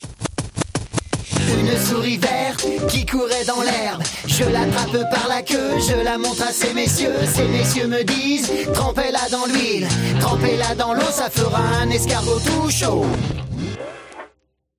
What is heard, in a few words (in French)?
Chant Rap Souris; Comedien